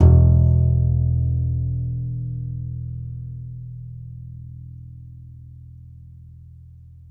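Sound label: contrabass balalaika, Plucked Strings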